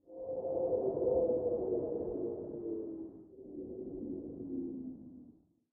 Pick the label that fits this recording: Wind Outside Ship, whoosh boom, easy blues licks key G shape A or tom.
Wind Outside Ship